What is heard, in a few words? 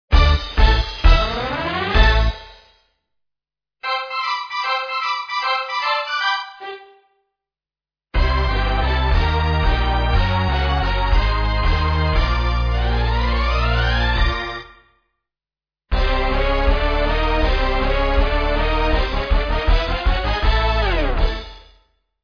the jingles